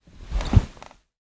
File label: household person sit down on dining chair wooden cushioned recorded underneath